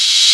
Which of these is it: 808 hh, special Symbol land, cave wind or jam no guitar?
808 hh